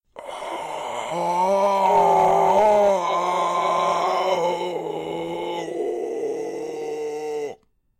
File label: Cultist Player Caught; Devour